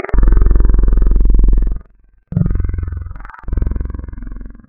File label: vocal phrase; Synthetic's Serum Collection; Echo Sound Works Core Tables